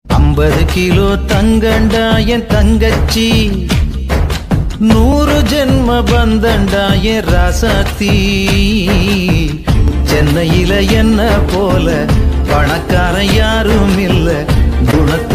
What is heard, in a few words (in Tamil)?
song tamil